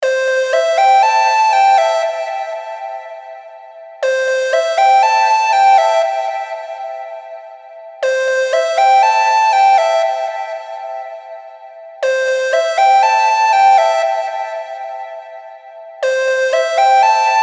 phone ringtone flute

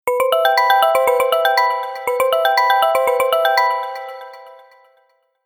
ringtone

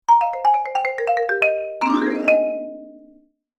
marimba ringtone

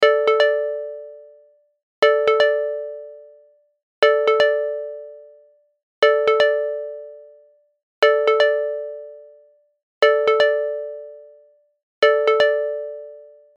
ringtone

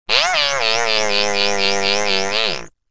funny message notification sound effect

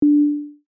short punchy sine wave ding, D

